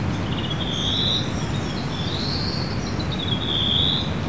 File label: bird; lmctf